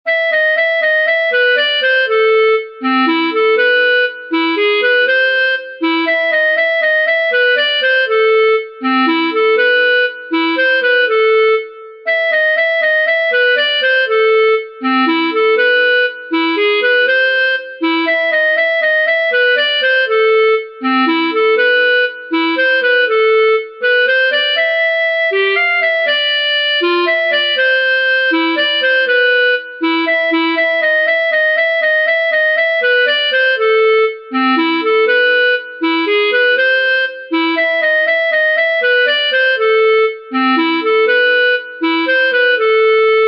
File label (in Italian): clarinetto; per elisa